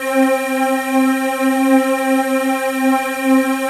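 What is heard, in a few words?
SEA pad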